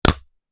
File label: Wooden cup Cheers, alcoholic drink